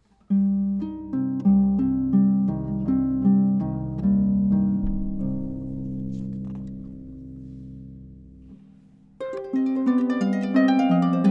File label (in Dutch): middelkleine harp